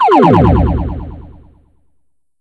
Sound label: Laser; SSV-Music